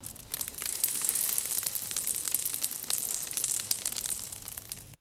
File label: fine debris trickling onto cement